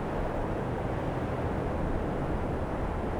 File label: desert wind; ambience; Soldier Of Fortune II Double Helix; XBOX; jordan